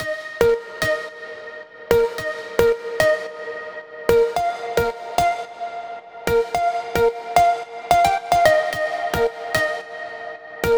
Tropical Lead - G# 110Bpm, House; Ghosthack Advent Calendar; House Kit; House Construction Kit

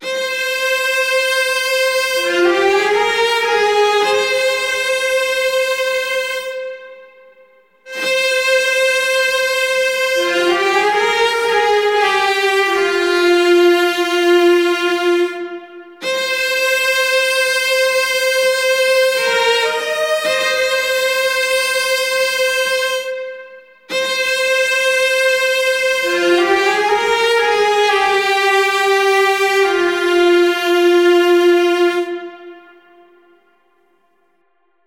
Solo Violin & Celli Melody - Fm 120Bpm; Construction Kits; STEMS; Ghosthack; SkyRealm; Kit 25 - Vvardenfell

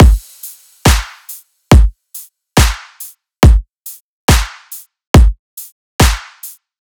Riddim Drum Loop 140 Full; Ghosthack; Riddim Essentials Volume 2

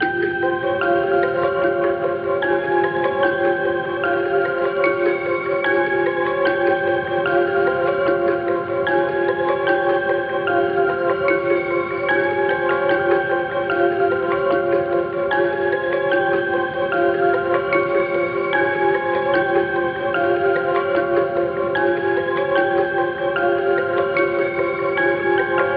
149 BPM F Min, Melody; Pearl

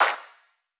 Clap, Drum One Shots; Cymatics; Eternity Sample Pack

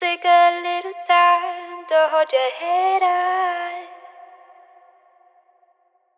Phrase Wet - 70 BPM C Min, Vocals; Little Time; Cymatics - Eternity Sample Pack; Phrases

